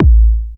Kick

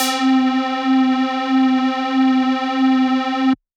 Dirty Dutch Lead C3

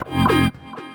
F STAB, funky

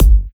Bassdrum; Mutekki Media; Vengeance Electroshock Vol.2

